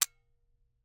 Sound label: Camera, Aimex SP500, point n shoot, plastic, snapshot; Eiravaein Works; Vaeyan IV